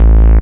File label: dirty bass tone; Basses